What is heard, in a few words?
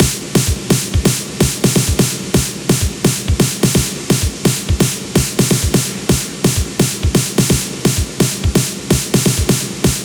128BPM Style, House Electro Fills; 8Bars; Vengeance Samples